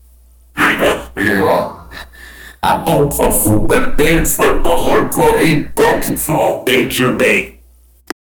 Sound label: crying emotional